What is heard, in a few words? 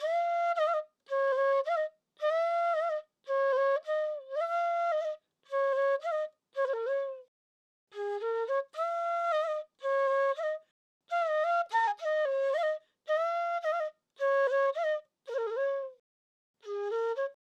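Trap Flute C major 110 BPM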